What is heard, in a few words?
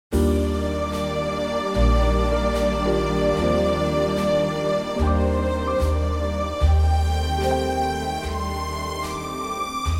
Eb orchestral; key - Eb - vocal range - C to D